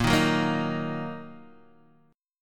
Bb Suspended 4th Sharp 5th, Bb, down, Guitar, Open D